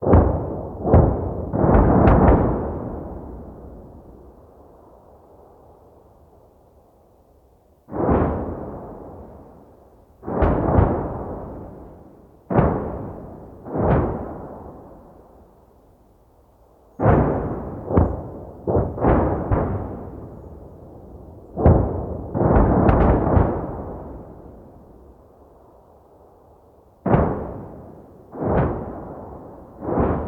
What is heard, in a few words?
Artillery Cannon Artillery; Sound Ideas; Guns